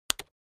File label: Button Solid Plastic